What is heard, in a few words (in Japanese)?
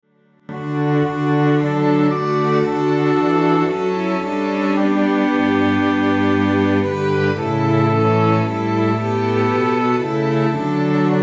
S Ob, ソプラノ・オブリガート(MIDI) - オーボエ音で強調してあります